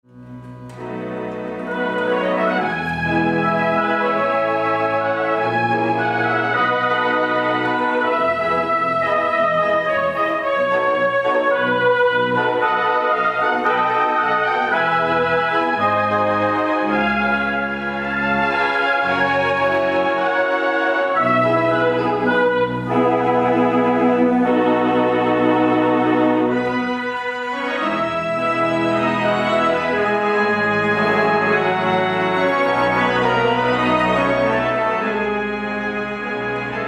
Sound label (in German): Improvisation kurz, Rundfunkorgel